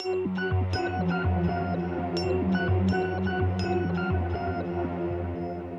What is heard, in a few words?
SYN, Hip Hop and R&B Drumloops